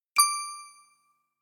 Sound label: start